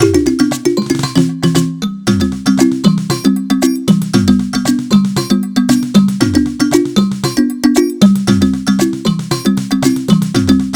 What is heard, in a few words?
Steel Drums; Incredible Machine 2